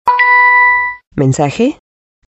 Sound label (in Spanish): mensaje